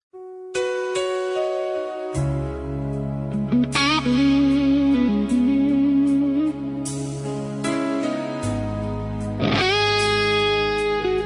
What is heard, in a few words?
Dark Blues Rock Guitar